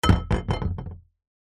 foley metal rod small hollow set down on plastic bin lid